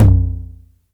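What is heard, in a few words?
909 low tom tape; Toms; Goldbaby Tape808 & Tape909; The Tape 909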